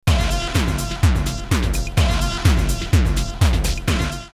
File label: drumloops Techno House 126; Thunderload; X-Static Goldmine